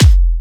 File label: Electro Kicks